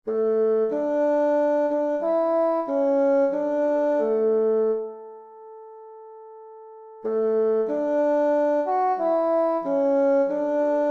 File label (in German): Fagott